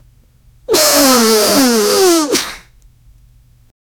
explosive sneeze sound; gross

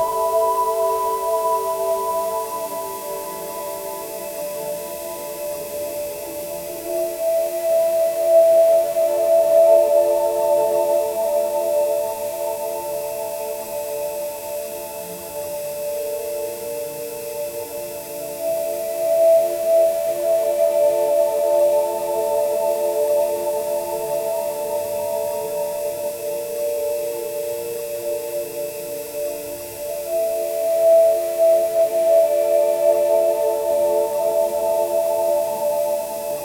dark forest canopy mediev, ghostly

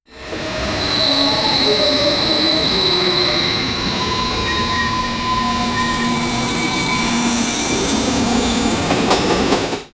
train station train, arrival

